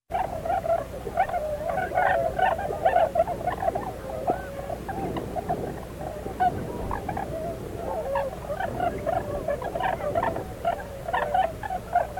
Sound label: Tundra Swans Overcast With Chill Wind